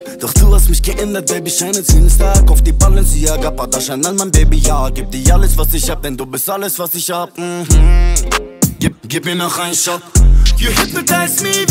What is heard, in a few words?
TRANCE